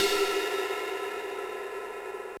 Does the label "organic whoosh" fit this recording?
No